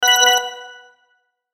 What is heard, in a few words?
Logo Ring Fast Reverb; Sonic Logo Sound Effects; Production Element Imaging Element Accent Transition